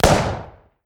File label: 50cal